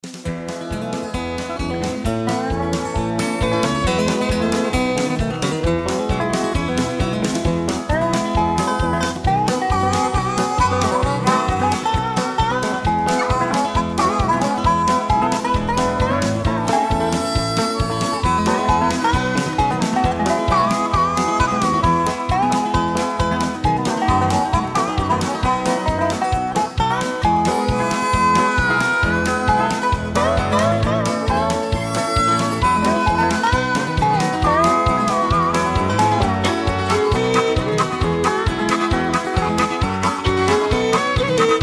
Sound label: Instrumental